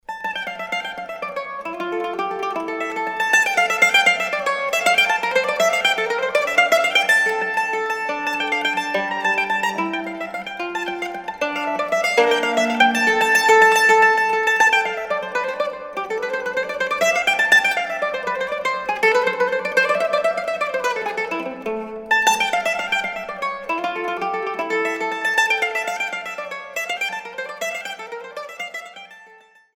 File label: Suite Gigue, mandolin